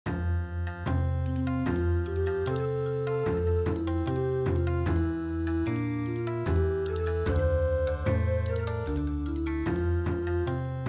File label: Bon Odori, midi